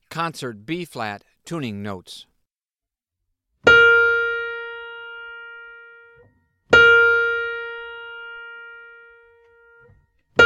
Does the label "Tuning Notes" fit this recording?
Yes